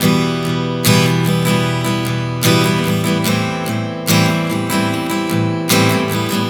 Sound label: Progression 074 Em-Am, Acoustic Guitar, Chord Progressions 074 bpm; Sonic Foundry; Songwriter Acoustic Guitar Companion